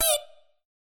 Sys Set pop down

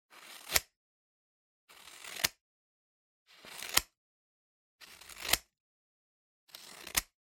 Scissors Cut Paper

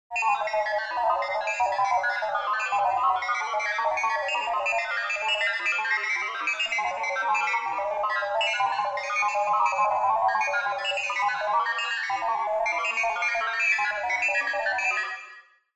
Cyber

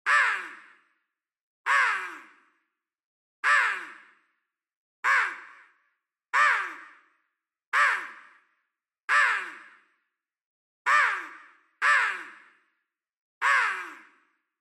Crow Synthetic

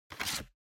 Time Card Rack Take Out

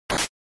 SNES RPG Attack Sword

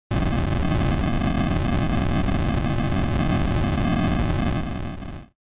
Collapse, NES RPG

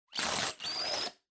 Blowout Double

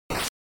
Noise Phrase, NES; NES-General

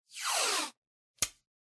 Masking Tape Pull Rip

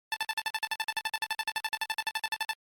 GB Action Message

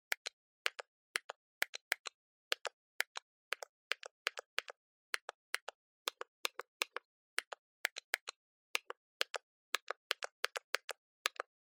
Cell Phone Hardware Button

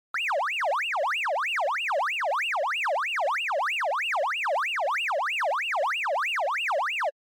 Arcade Shooter LFO

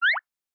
Motion Pop

